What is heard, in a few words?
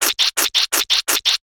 cartoon pump wet squelch; zapsplat